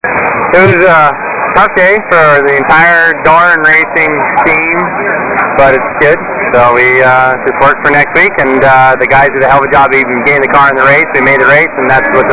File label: race, racing; laguna